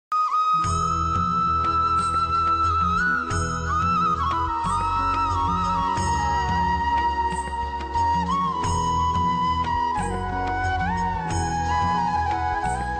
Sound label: Krishna Flute Ringtone; Star Plus